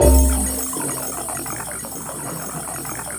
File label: 3RD CAVE, SYNTH; Q Up Arts; Dream Experience